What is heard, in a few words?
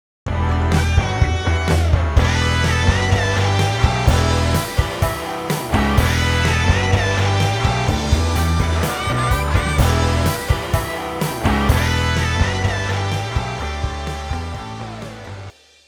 Hip Hop